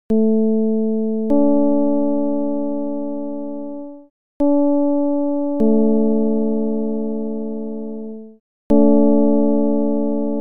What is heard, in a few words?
csound foscil 220hz